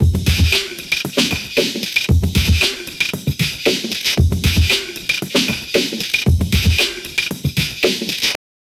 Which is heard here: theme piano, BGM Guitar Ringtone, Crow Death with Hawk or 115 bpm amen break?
115 bpm amen break